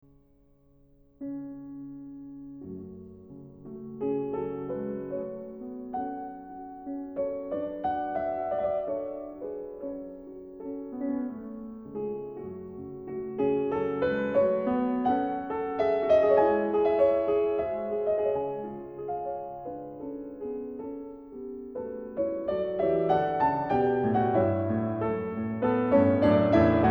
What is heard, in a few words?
Andante